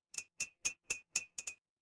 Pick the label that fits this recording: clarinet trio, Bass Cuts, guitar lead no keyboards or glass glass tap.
glass glass tap